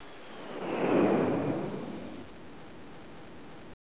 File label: song; vchat; Help